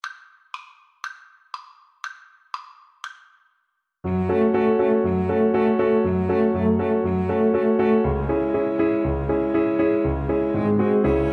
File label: Piano Trio